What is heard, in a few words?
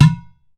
UDU SLAP, African Perc; E-MU Formula 4000 Series Vol. 4; Earth Tones